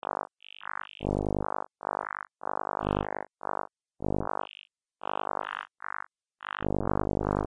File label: synth; monopol